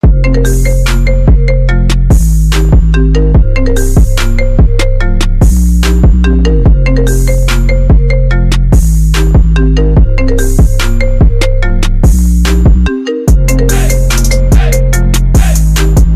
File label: Iphone trap remix